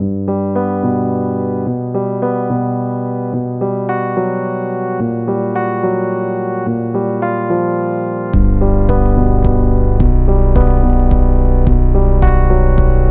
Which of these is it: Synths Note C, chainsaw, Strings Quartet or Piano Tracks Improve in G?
Piano Tracks Improve in G